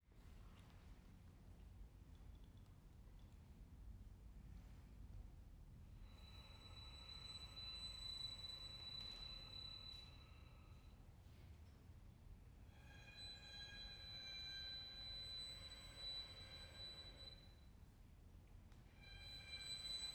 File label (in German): String Noise, 2 Violinen; Transient Series